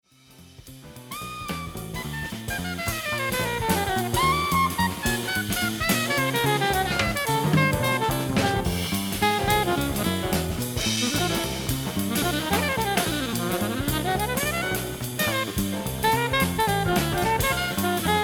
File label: chord progression alto solo